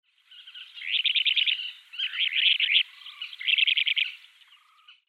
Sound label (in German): Seeregenpfeifer Charadrius alexandrinus; Vogelstimmen